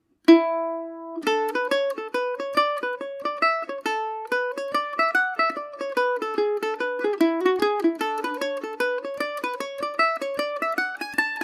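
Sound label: A major paris swing, irish mandolin; jim boultons fancy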